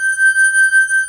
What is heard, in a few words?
CRYSTAL WAVE